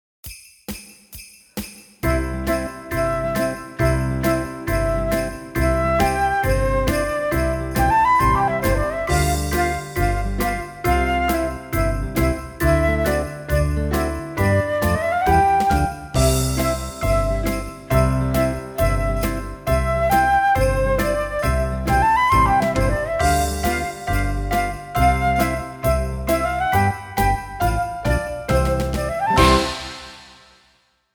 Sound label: Jingle Bells Slow